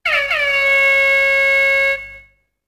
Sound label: horn; UFC Tapout